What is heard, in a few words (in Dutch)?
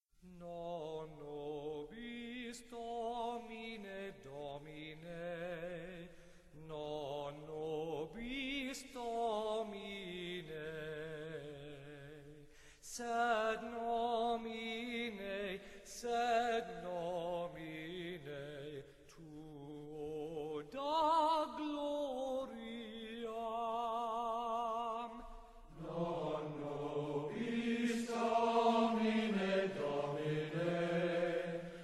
solo koor muziek